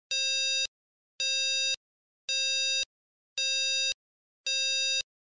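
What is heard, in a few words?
alarm clock sound like a phone busy signal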